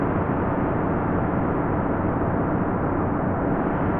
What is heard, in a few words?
sparse soundscape samples; ReLooped